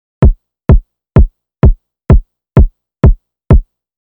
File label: Trap